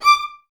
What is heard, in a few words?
Violas D, String Ensembles; D 6; Miroslav Vitous; LINKS ORCH